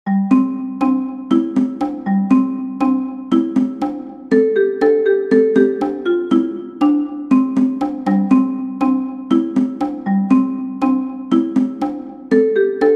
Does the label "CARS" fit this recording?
No